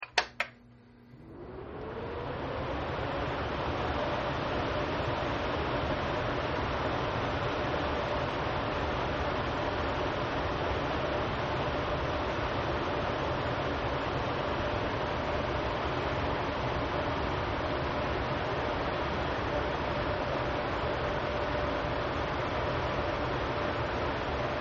Tower Fan Med, Tower Fan Running At Medium Setting; Fans; Electronics